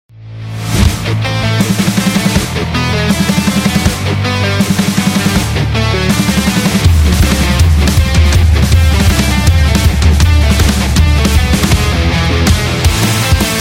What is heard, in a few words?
Punk